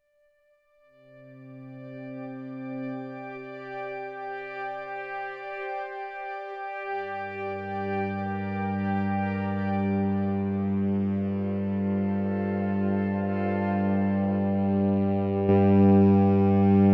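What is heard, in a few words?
Super Gemini Polyphonic Aftertouch VCO Rise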